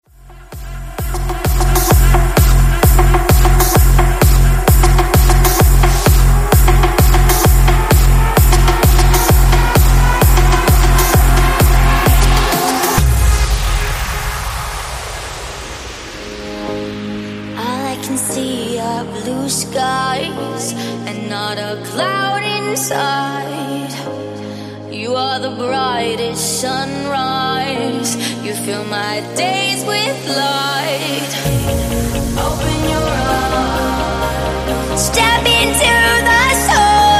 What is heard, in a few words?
EDM Banger